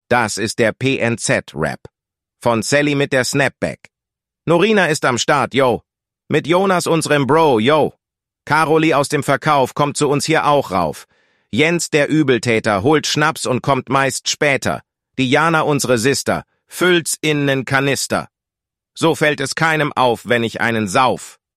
rap

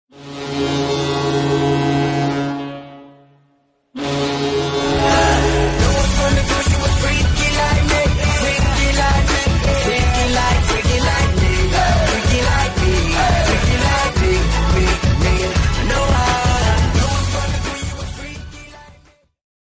hymne

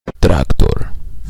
traktor

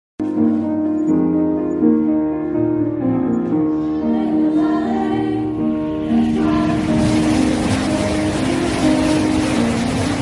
chor mit uberdecktem zug

